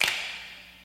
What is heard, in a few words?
classic large room hand clap D key